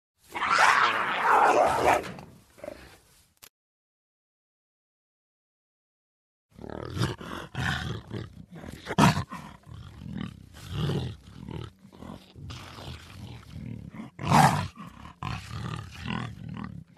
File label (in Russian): rychanie jaguara